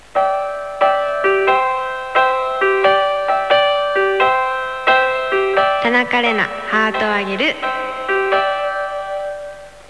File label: jingle